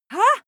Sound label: female shout surprised; schreie; menschen